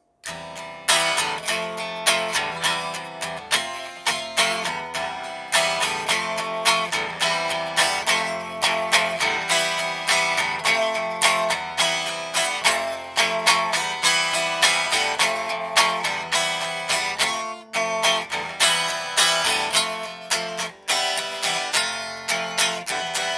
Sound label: Cmaj7 F G C